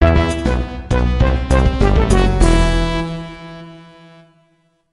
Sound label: You Lose; block breaker deluxe 2